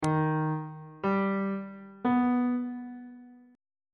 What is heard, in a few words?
d sharp augmented triad chord intervals on bass clef